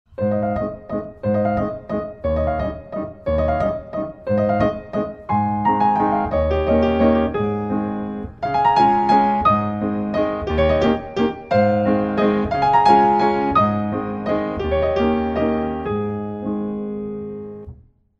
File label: Landler; Schubert